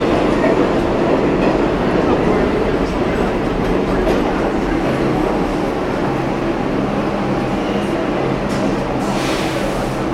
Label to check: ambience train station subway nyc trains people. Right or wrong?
Right